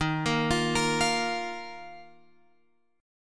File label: D sharp Suspended 2nd, Guitar, Open F; arpeggiated; JGuitar